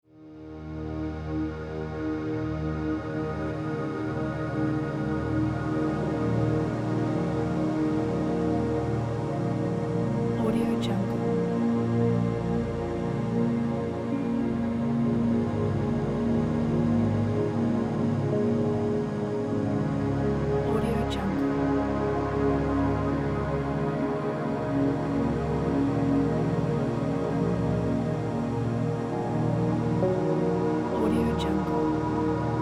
ambient cosmic soundscape

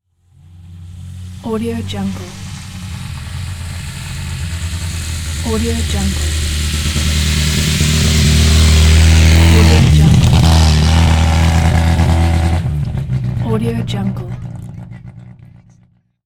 rally car slow passby; debsound

